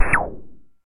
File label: Video Game Fire Effe